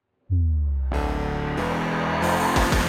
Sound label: Castle Battle Intro; Folk Hero